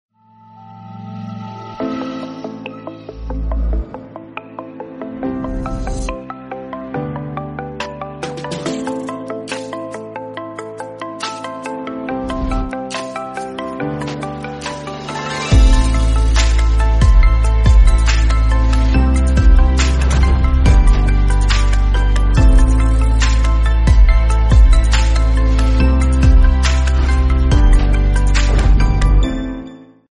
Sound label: mi note 8 pro mobile ringtone